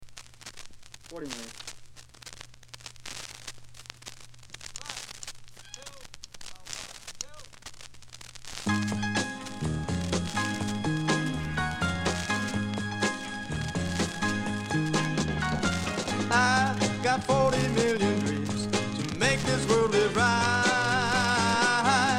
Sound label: Band With Horns